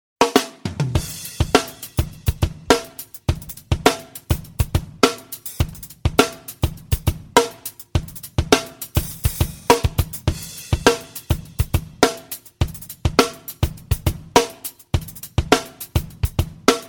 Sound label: Drums Original